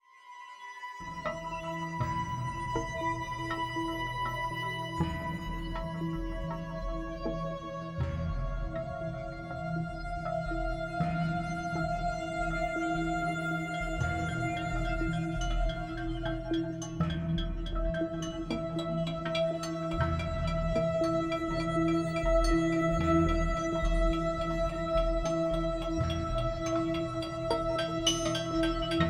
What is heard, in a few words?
Music; Sifu